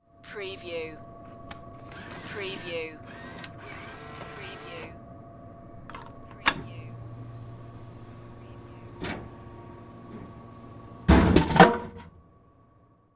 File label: Vending Machine